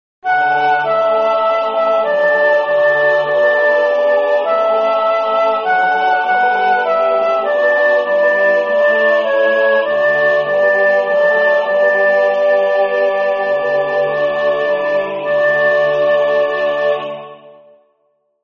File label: Osanna, Soprano; Zelenka; MissaPaschalis